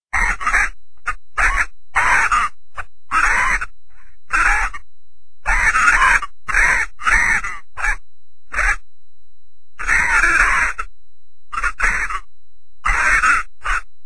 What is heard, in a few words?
parrot squawks